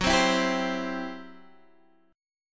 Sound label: C Major, F sharp, chord